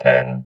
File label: VOX VOX, Electro Vox